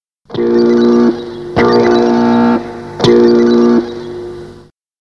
Jingles

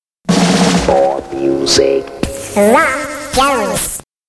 Jingles

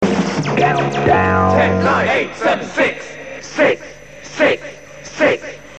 Countdown Jingles

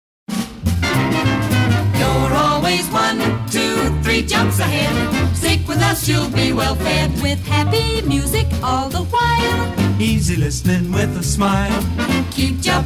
Pirate Radio London Jingles; You`re Always 123 Jumps Ahead

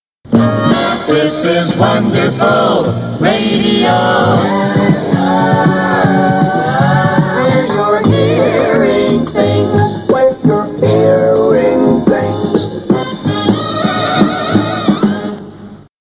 Jingles

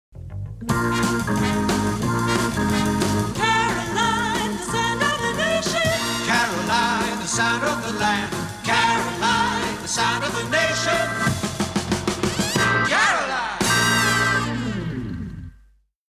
Radio Caroline sound of the nation jingle

